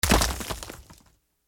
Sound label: rocks being cracked; hollywoodedge